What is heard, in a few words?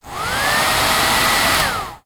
Hair dryer med